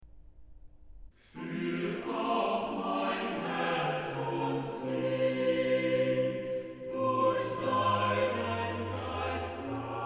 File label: Chorale, organ; BWV0005; BACH; CHORALE PRELUDES